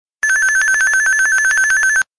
Analog Ringtone

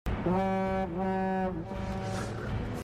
horn; horn of gondor

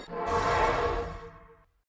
Portal Open

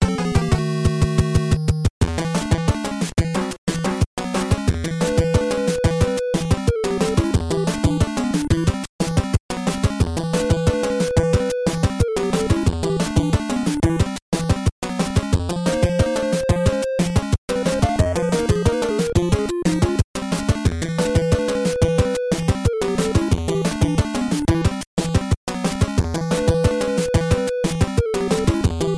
Boss Battle; SMB3

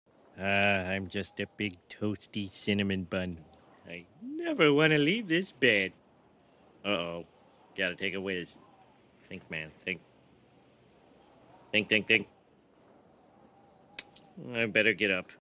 METAL

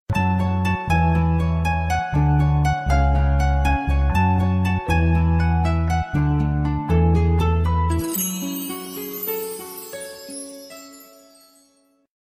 iphone 4 ringtones; classical

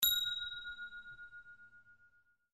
google voice ding; ringtones; sms

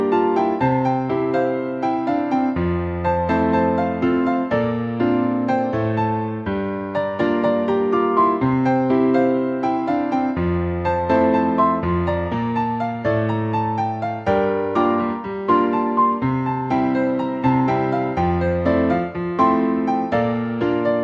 Piano

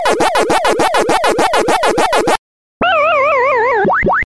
sms

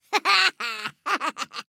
Laugh; Gnar

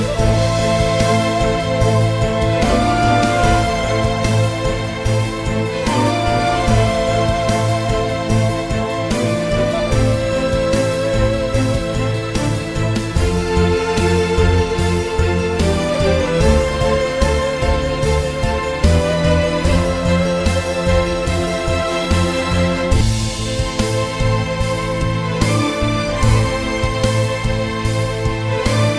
ney

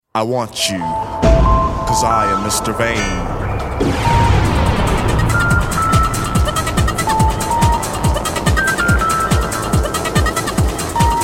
hypnotic trance, ringtones

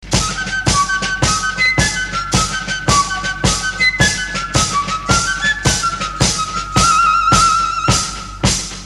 goth dark flute sms; alternative

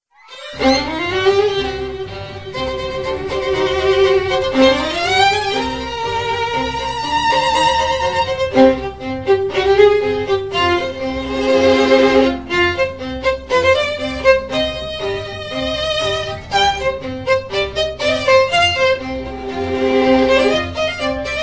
violin; bbc sherlock; taxi chase

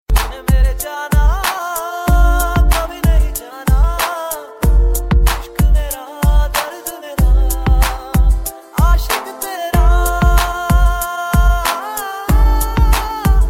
emptiness sad

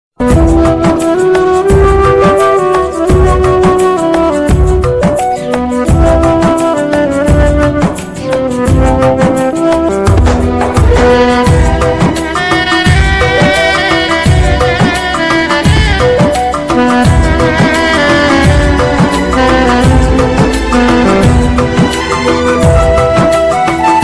Best Violin

